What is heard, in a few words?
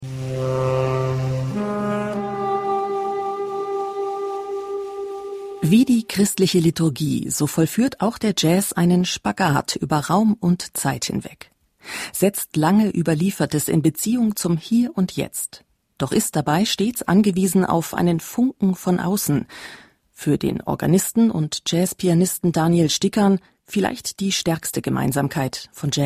Jazz; Bayern2; Jazz und Kirche